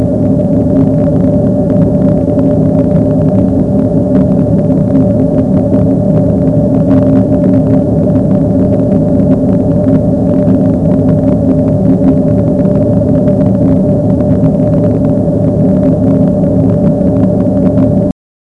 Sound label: engeneering, space atmo; Terra Sound Library; xlarge